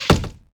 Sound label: arrow wood